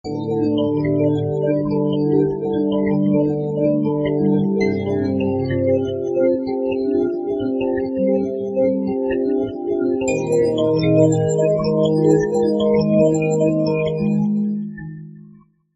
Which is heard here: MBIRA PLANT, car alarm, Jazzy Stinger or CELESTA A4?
MBIRA PLANT